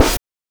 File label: OH; NES DPCM; MIDINES